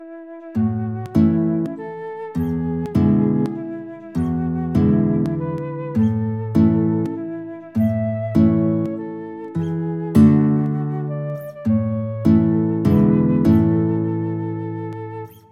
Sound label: happy birthday FL GUIT duet, guitar flute duet